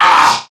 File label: Pain Crewmen; Warframe; CorpusCrewmen; CrewmanA